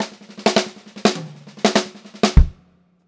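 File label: STRAIGHT FILL, Funk-Groove Drumloops; USB Soundscan vol.38; AKAI; 90 s Sample CDs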